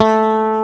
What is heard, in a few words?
BASS A-1; WORS STATION; ROCK